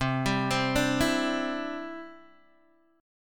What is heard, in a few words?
C Major Add 9th, Guitar DADGAD